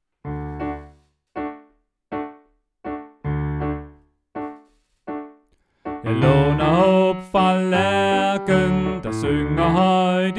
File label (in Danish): Tenor